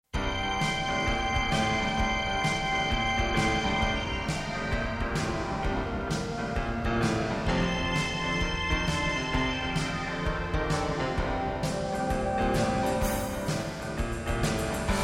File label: Boogey Blues